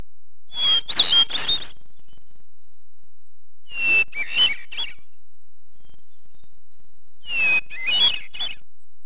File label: THRUSH; Webster's Millenium 2001